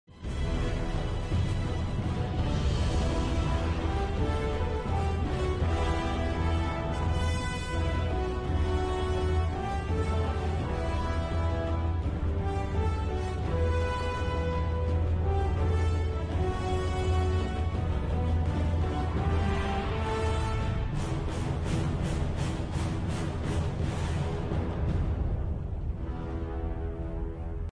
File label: instrumental; the hobbit